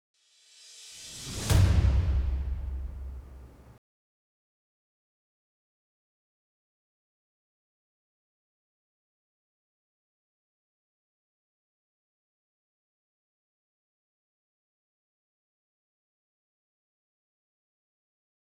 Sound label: SFX; Upfronts 2016; Dating Games; TVONE